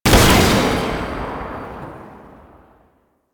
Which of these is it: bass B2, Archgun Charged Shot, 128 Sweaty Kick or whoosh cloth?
Archgun Charged Shot